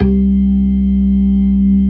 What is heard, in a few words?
CLASSIC C3, Synth and Organ